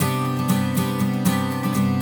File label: Spacious Guitar, loops